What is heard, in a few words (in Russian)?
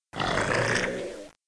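звук аллигатора который поймал добычу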